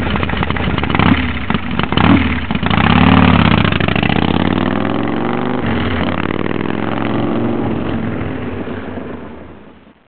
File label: Pro Pipe